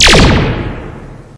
smite damage; tyrants edge

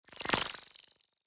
mastermind place

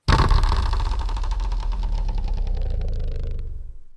roulette

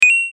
coin; alien rush

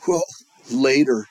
bear outro; the jewel weaver

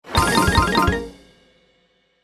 match; Virtue Slots

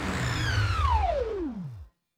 warp; fertig classic games; vega

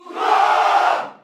rally; tyrants edge

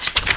flip; ulti match

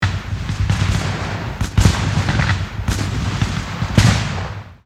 battle gunfire classic

